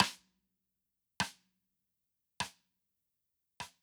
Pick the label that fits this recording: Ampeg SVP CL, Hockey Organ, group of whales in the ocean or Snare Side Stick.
Snare Side Stick